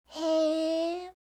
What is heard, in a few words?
hee, reaction, voice